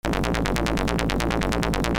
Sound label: RHYTHMIC